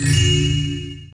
PICK LOOT; thief

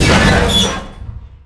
hit ship

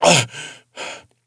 choke; SINDEMO; player; blade

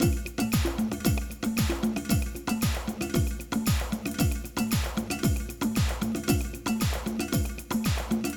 Latin Loop - kick, Drum Loops; Cyclotronic Resonator; Loops for ACID; Quantum Drum Loops

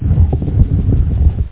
tomb; UFO Enemy Unknown CD32; Tactical Fx

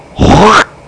hocking beep; Short Miscellaneous

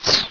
Swish; Ultima III 1.4.2; Ultima Sounds

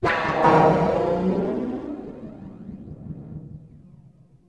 AMBNT 160; Ambient

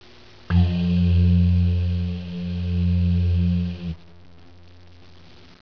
foghorn

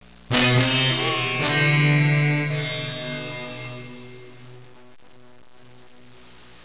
synth

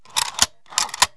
imprint, money; KABOOM! - Wild and Wacky Sound Software

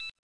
scrl vol; Sierra On-Line; SWAT2DEMO

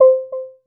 message; SoulSeek 156c

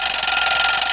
Bell rng; Oasis demo

